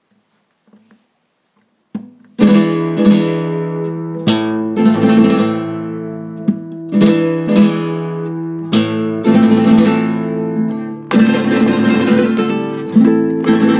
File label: farruca